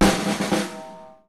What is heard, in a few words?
snare roll